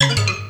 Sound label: combo break